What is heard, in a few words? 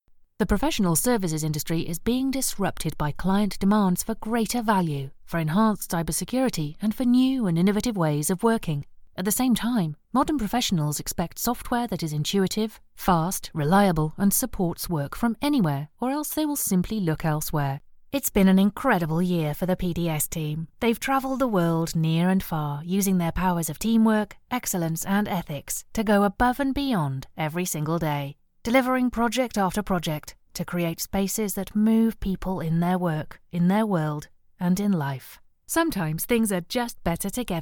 Corporate